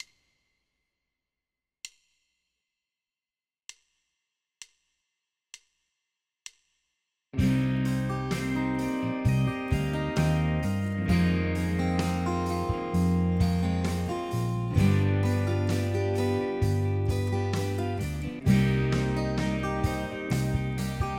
i iv V7 i min

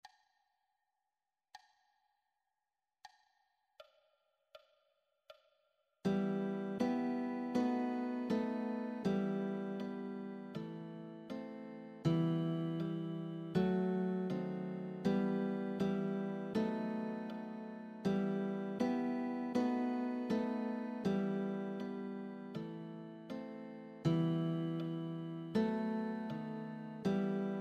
old man and the sea gtr iii

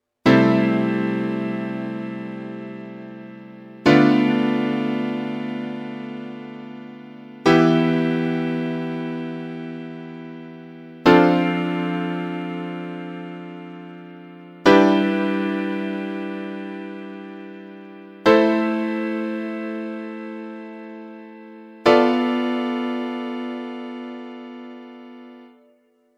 diatonic 6s

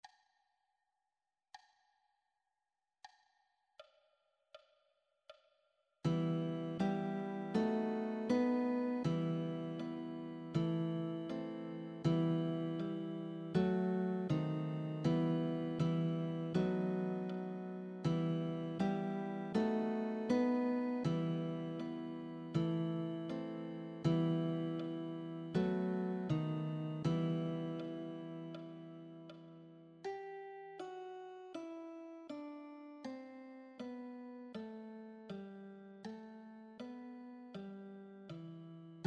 old man and the sea gtr iv

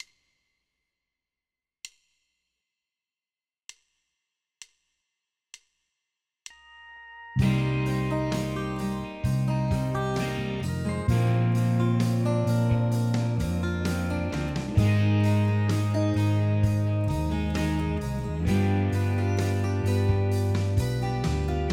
i VII VI V7

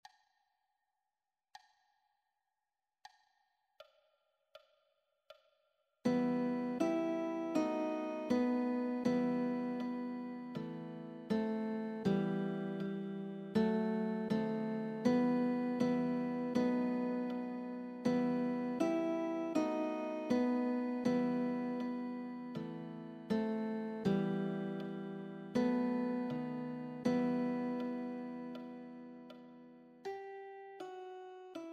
old man and the sea gtr ii

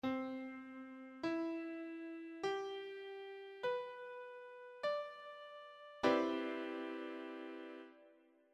Cmj9

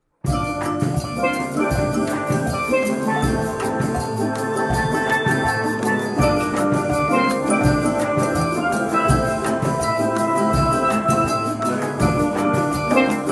la samba

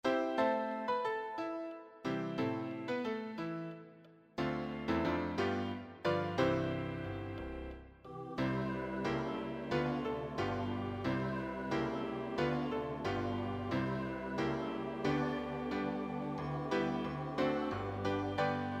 In May-Time Piano

